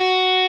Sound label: Arp. 8